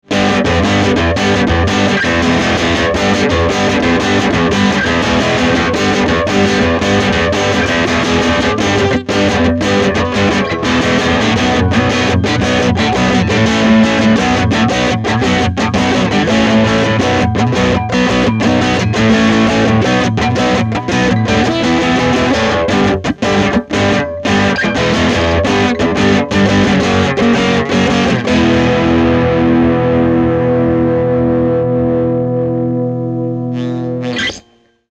Retro20 OD

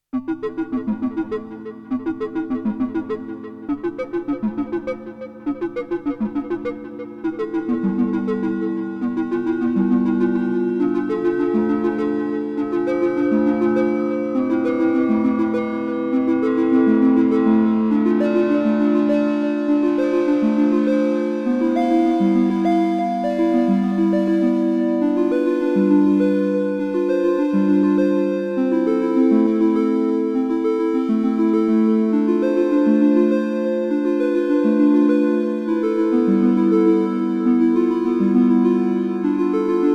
Behringer Pro 800 Reverb

